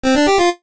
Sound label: jingles NES; Kenney's Sound Pack; Music Jingles